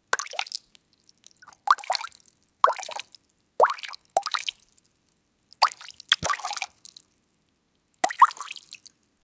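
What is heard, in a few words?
sound of dropping something, submerged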